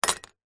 industrial tool metal spanner or similar set down hard; zapsplat